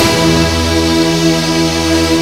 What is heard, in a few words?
ENSONIQ STACKED STRINGS